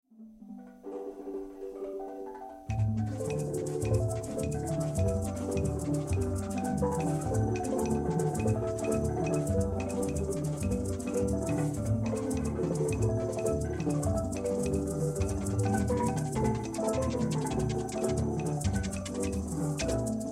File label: steel band clash